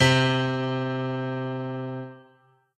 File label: piano